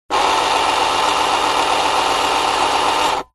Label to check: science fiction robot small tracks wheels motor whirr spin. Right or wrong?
Right